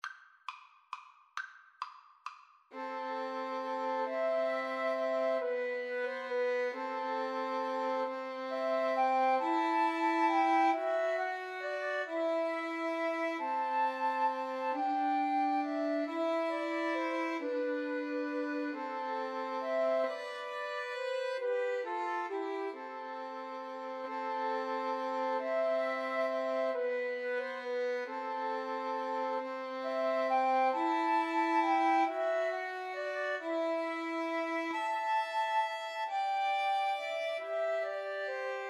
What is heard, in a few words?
Flute Violin Viola